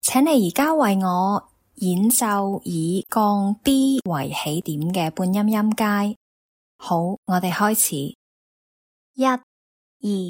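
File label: chromatic scale starting B flat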